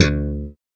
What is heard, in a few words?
BASSES, Roland